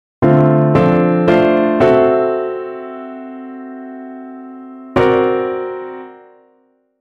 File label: drop 2 chords, piano